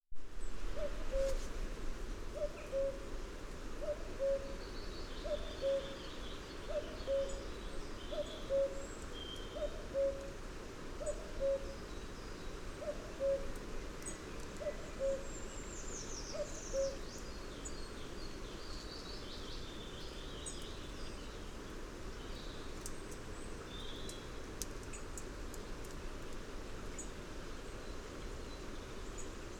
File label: woodland sounds